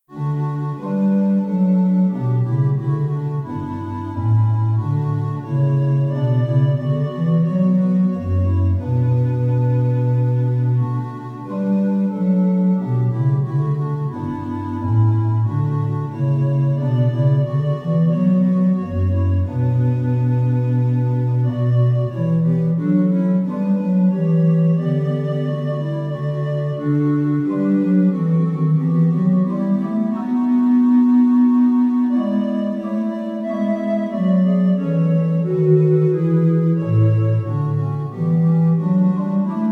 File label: Instrumental; Bach-St-Matthew-Passion-3